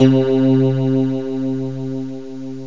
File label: guitar slie